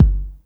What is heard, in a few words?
BD, Dance Mega Drums, BD HOU; Best Service